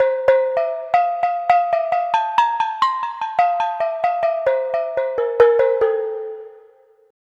Pelog Gong Melody; Melodic; Ambient-Melodics-II

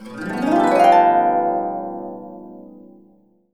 B, Harps; USB Soundscan vol.62; AKAI

